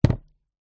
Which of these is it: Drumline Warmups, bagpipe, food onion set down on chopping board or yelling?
food onion set down on chopping board